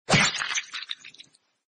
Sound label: egg